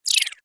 Fast cog down

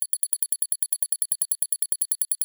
Clicks beeps series

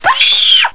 scream; Sex and the City

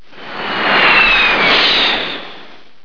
jet doppler

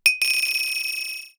Glass tap eco

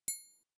Bell dull

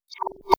Pop

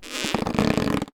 Rubber suit

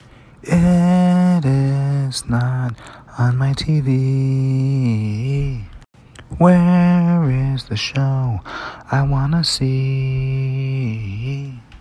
chorus

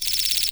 Miniature piston

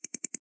Flash clicks

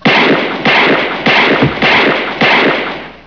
gunshots

